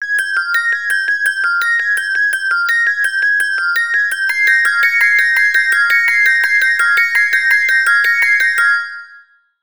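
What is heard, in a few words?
Circular, ringtone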